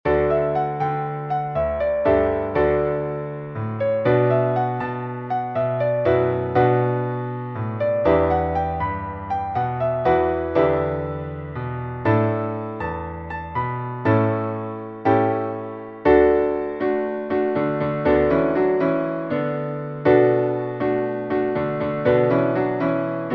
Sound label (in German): Singspruch Vorspiel